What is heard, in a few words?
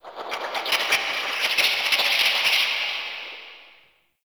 Crackly Birds; Ambience; Warframe; Shadowgrapher